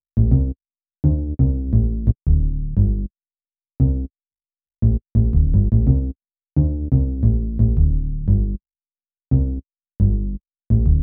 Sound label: Bass, Hip-Hop